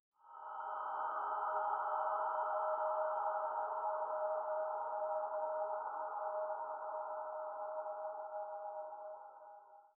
magical

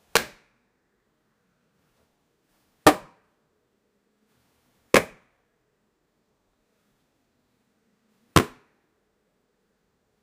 black hole punch

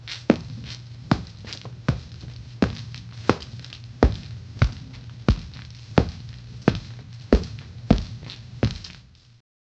light rhythmic foot impac, gym

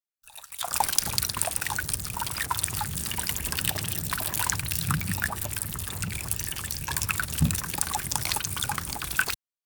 water emerging

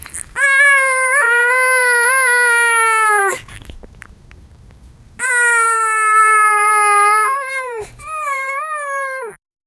sad puppy crying sound puppy

